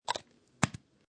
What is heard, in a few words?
Put Plastic Lid On Spray Can